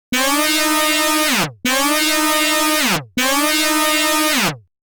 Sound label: Stage WARNING; Darius; Darius 30th Anniversary Box